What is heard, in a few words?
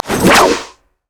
wildcards; LoL; twisted fate